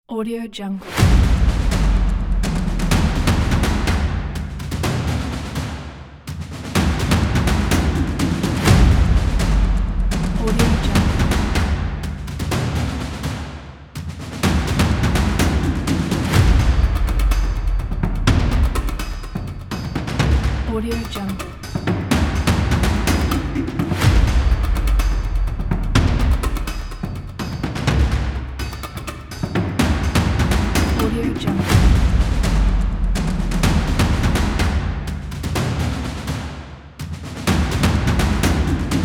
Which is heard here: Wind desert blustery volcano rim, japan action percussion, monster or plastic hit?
japan action percussion